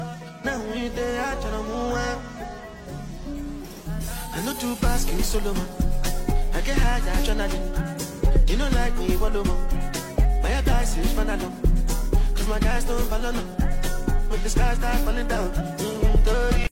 Remix SpedUp

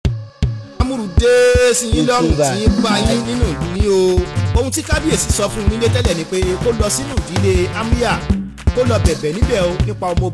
Mara Beat

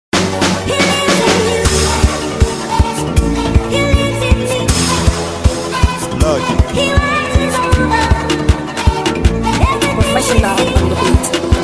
Professional Beat

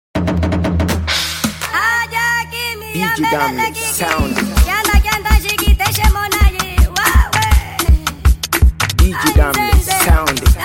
Mara Dance Beat

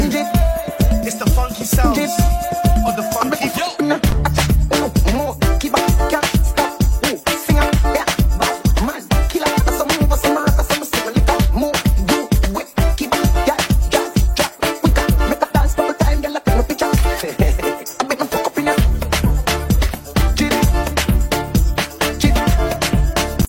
Funky Drift

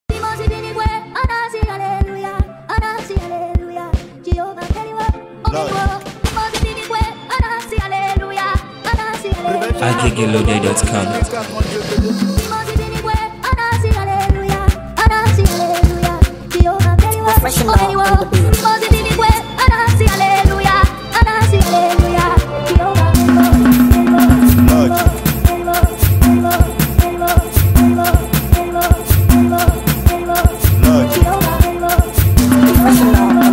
Beat

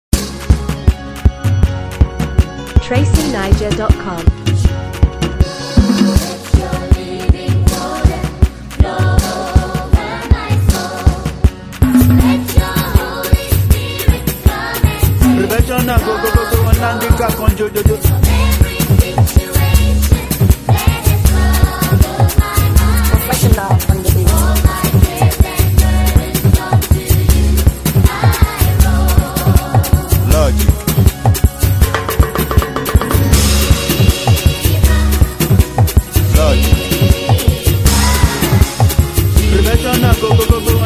Beat

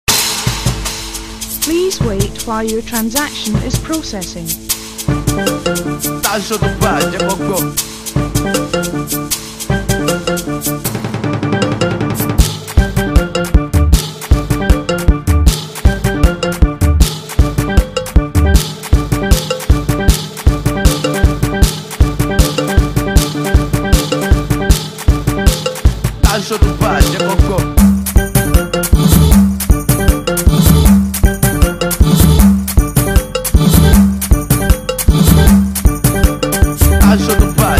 Mara Beat

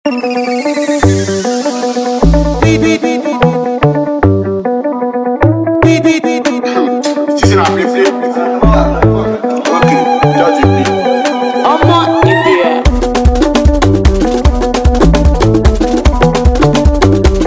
Afro Marakosa

